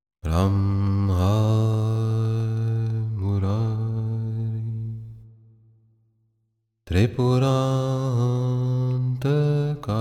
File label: planets bhajan